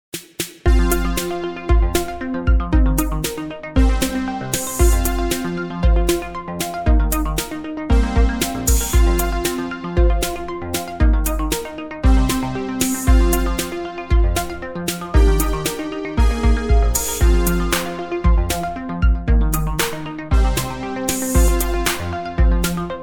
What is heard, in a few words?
Incidental music